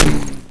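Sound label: impact; StarSiege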